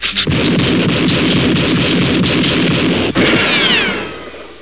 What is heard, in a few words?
gun rapid fire 10 shots